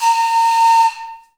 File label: AF FLUTES, Heart of Africa